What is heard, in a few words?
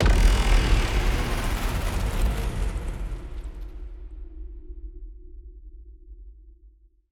Instant Extraction